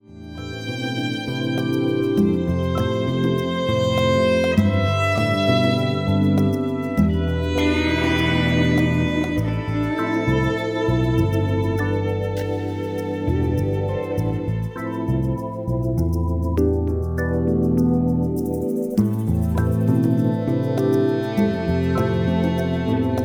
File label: Lounge